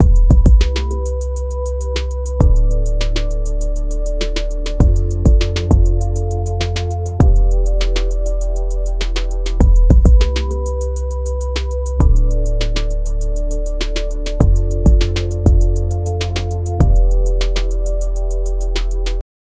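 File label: create a cool royalty free, chillhop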